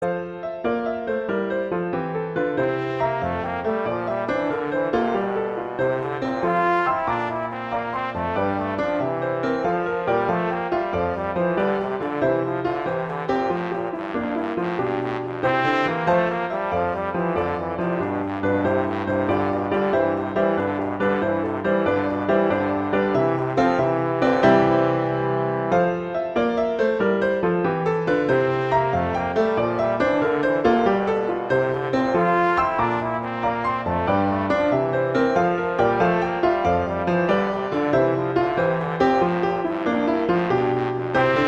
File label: Gigue; Pachelbel; Canon